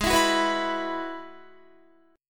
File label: Ab Minor 7th Sharp 5th Ab, down, Guitar, 4E, 4A, 5D, 5G, 5C, 6F; Abm7#5; strummed